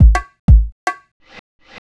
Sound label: HOUSE BEATS 125